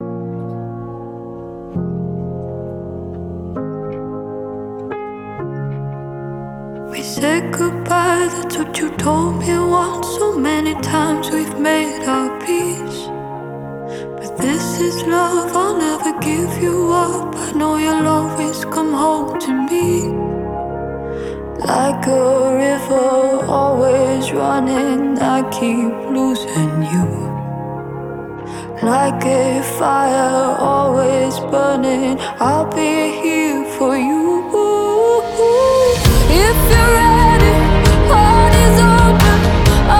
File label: Acoustic